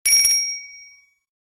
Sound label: S3 Temple Bell